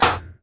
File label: Mouse Trap